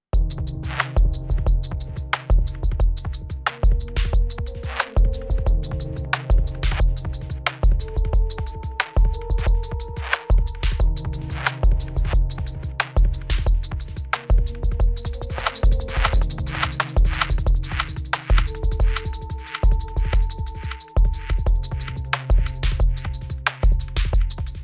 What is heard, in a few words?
180 bpm and some melodies added. Single pattern, muting/unmuting.